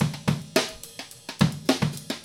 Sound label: live, drums; Loopasonic